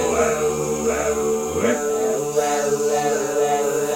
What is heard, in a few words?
tessellation; tuxpaint; magic